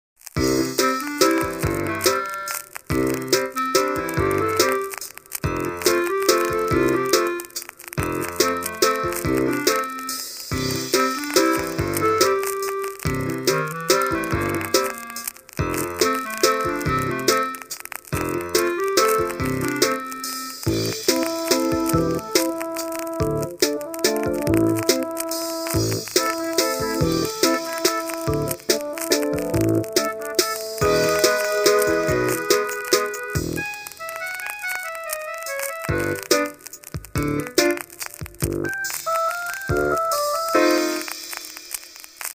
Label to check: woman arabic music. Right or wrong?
Wrong